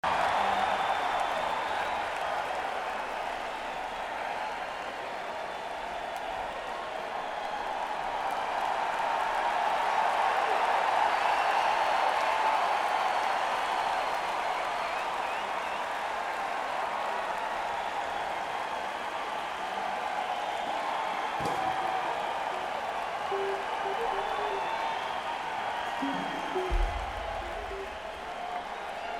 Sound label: Crowd; Mansfield, MA; Tweeter Center Boston